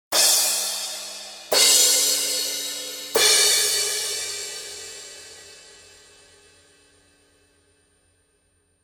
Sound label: Paiste Crashes